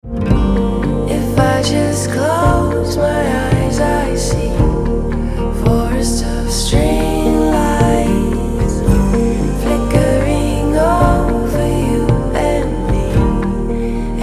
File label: Acoustic